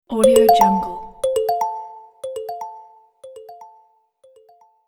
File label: calm pleasant notification